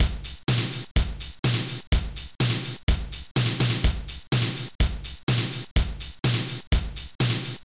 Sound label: beat